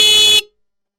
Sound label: Bike Horn